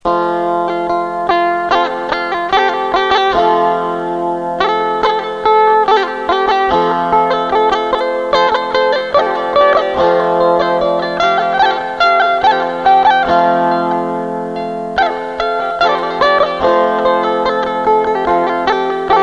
Sape Borneo Music

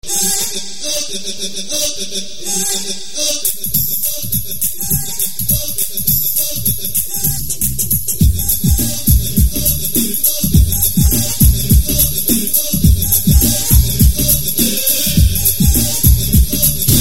Cool Music

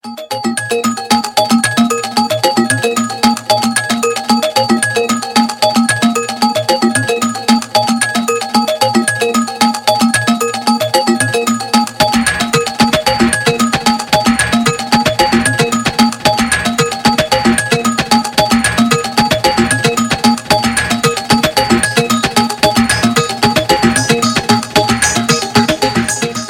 Bells

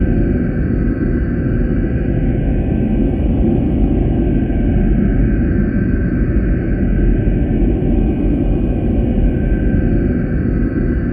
Engine

